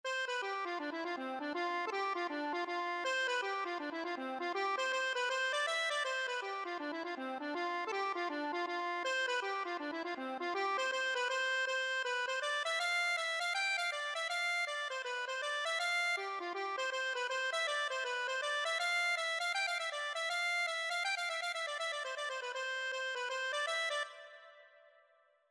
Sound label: julia delaney, accordion